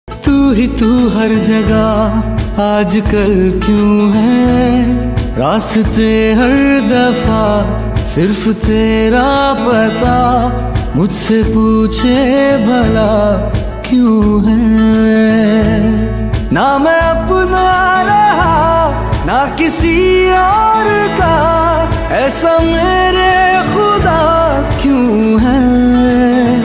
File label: male version hindi ringtone